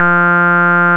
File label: 101 SYN3, Vintage; INIS